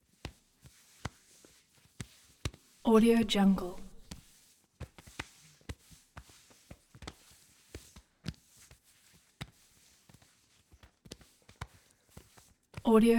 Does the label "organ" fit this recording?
No